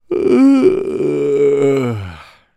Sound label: human male 37 years old yawn